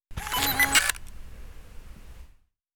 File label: zoom camera